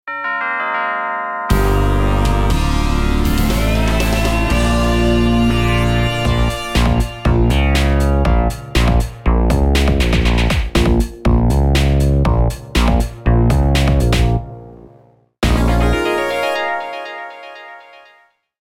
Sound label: Thing Car Battery